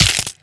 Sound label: bone break